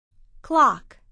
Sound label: clock